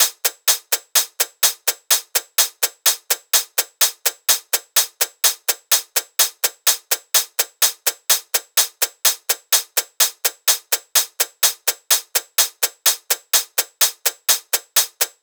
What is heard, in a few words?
hat loop 63, Drums; Pop; Hat Loops; Slate; SD PASADENA Pop Sample Pack